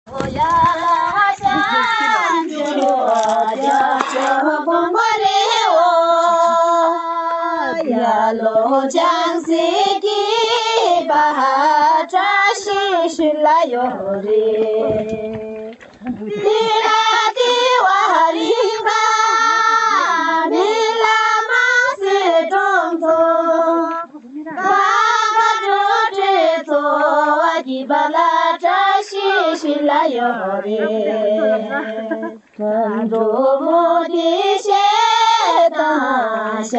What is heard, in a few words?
Tibetan Song